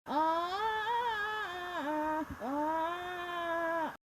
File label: uuuhh